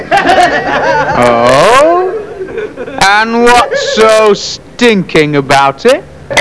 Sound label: stinking